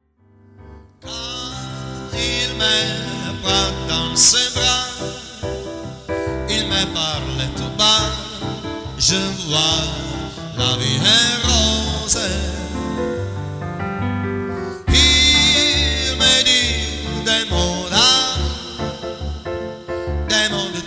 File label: PIANO BAR